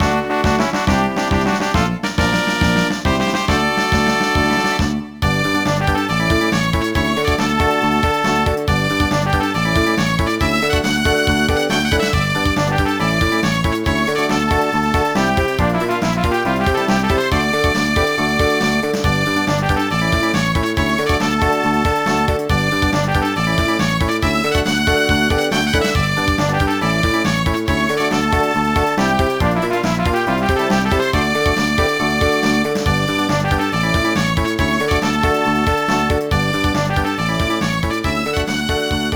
Fanfare; Chrono Trigger NDS

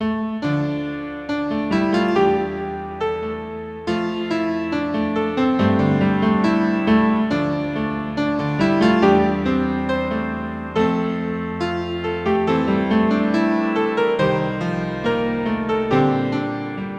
Sad Song Piano; Legend of Legaia Soundtrack

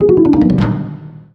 Jingle Training Failure; Star Fox 64 3D

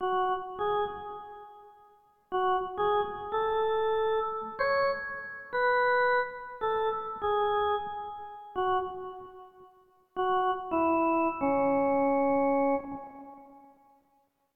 Play The Organ; Legend of Mana

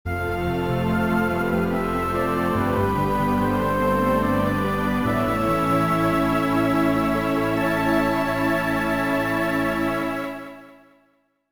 Congratulations; Mario Golf

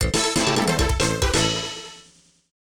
Game Over; Super Street Fighter II Soundtrack

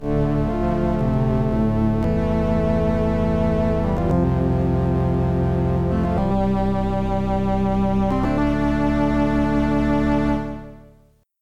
Game Over; Tyrian Original Soundtrack

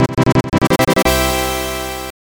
Your Horse Won; Ganbare Goemon; Legend of the Mystical Ninja

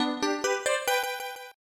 Special Item; Dragon Quest III

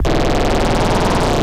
Door Open; Sword of Vermilion

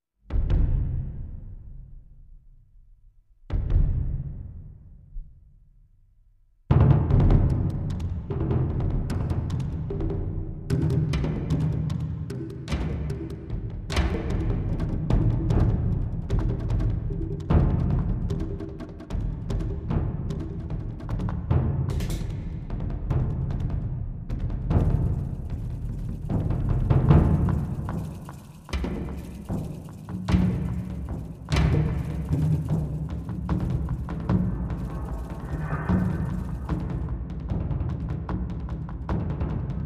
Drums

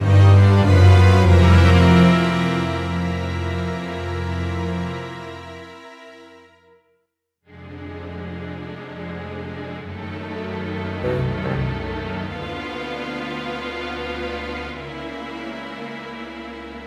Game Over; Star Fox 64 3D

